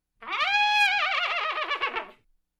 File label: Funny lo-fi comedy horse neigh